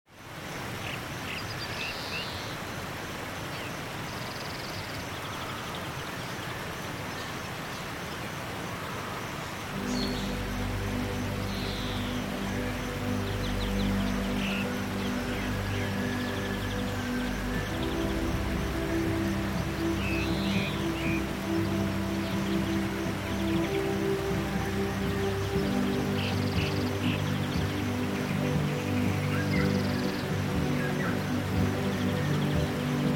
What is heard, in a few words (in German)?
wasserfall meines lebens sound; Orte der Entspannung; Phantasiereisen; Tiefenentspannung